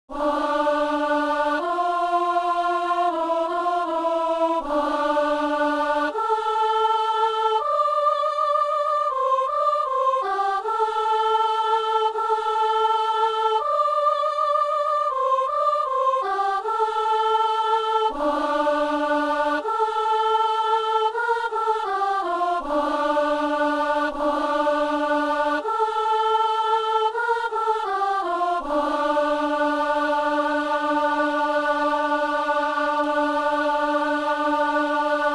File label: soprano; Messe inaugurale - Kyrie; Messe du 17 janvier à Saint-Denis